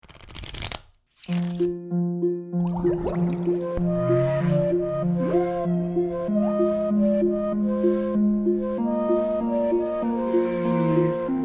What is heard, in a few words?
Go-fish Karaoke